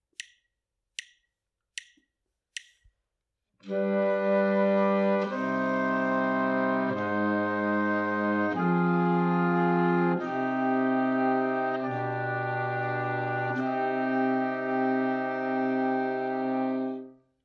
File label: harmonic dictation; ear training